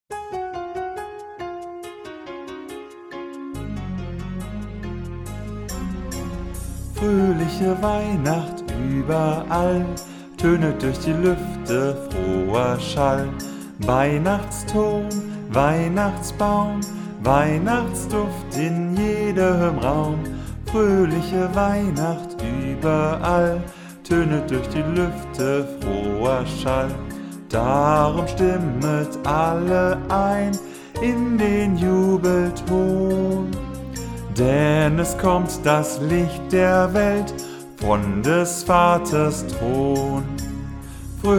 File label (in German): mit Stimme; Froehliche Weihnacht; ministranten